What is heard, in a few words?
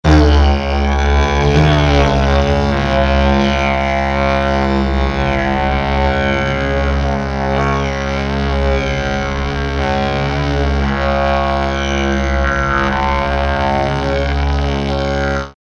didgeridoo drone